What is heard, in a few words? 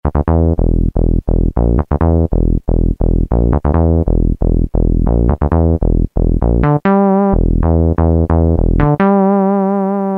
SH101 Bs, Roland M-DC1